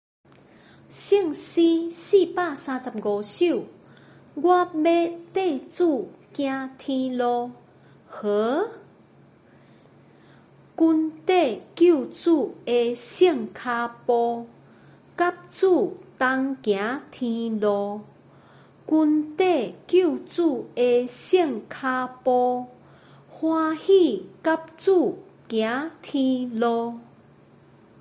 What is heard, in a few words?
Chorus, children; follow the steps of jesus